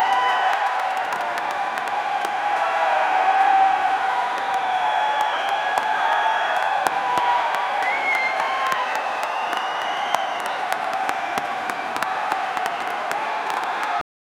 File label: looping ambient cricket